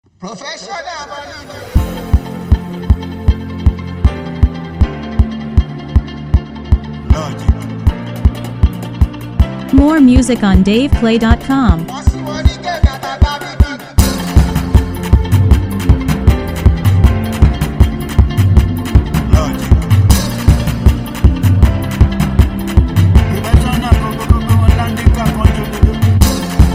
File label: Professional Beat